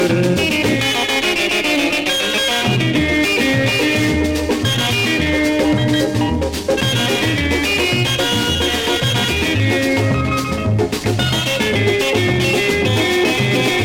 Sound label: Guitar Spyder